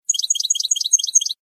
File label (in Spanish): floreo chavi chavi; campero